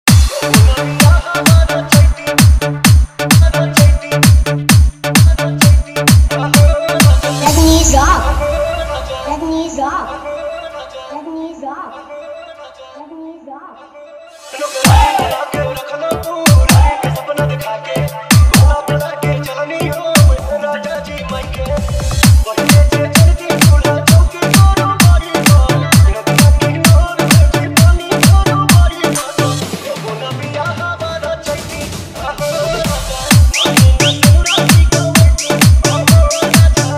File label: New Dj Song Hard Bass Bhojpuri Song Remix